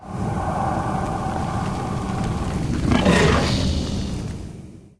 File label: blaze hound idle, monster